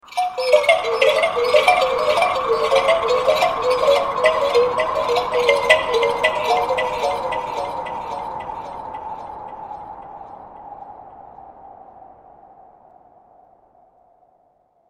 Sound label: Carnival Creepy Music Box; Scary Tone Sound Effects; horror sound effect